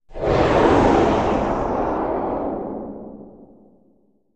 Animal Stinger Bull; stingers & whooshes

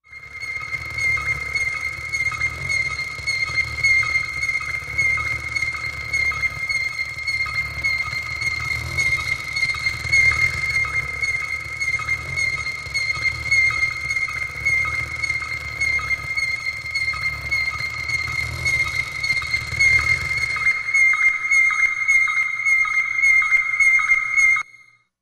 Distress Polisher Mac, alarms

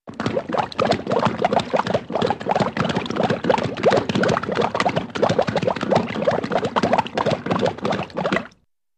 Funny Horse Drinkin

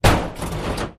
Axe Hits Door Heavy Wo

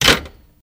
Jeep Chrke Tire Mount, jeep cherokee 84

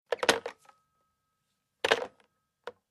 Tel Hang Up Rotary

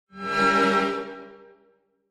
Violins Shrt Reminde; strings

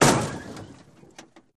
Auto Crash Lrg Head, car crashes

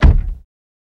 Car Door Close Hvy Thu

